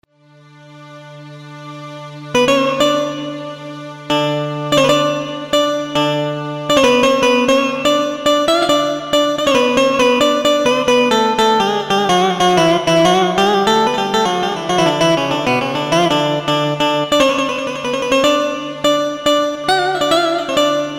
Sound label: Elektro